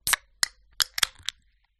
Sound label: food drink can soda open; zapsplat